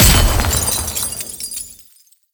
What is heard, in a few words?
death explode, manhack; npc; Half Life Alyx